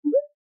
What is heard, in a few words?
room get ready